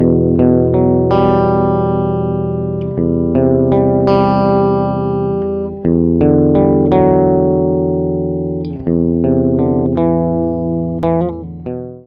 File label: sad alone